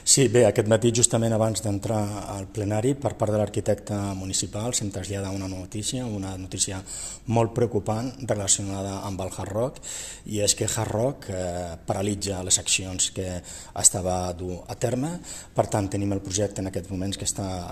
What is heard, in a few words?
Hard Rock